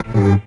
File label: G STAB, funky